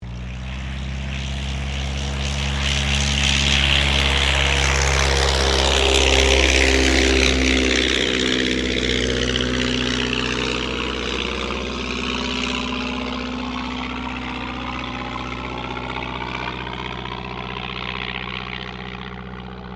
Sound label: AIRCRAFT DH82A DEHAVILAND TIGERMOTH TOUCH N GO; RAAF Tigermoth; Airplanes; Vehicles